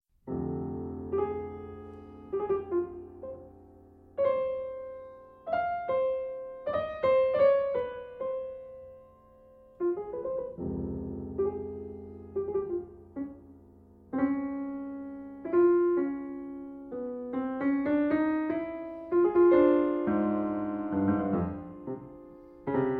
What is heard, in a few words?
Jazz Noir